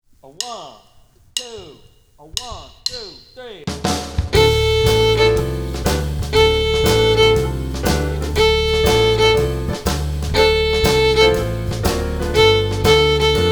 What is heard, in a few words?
Play Along Rhythms On Open A, Four Times Each